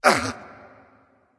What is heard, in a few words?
grunt hit; smite; ram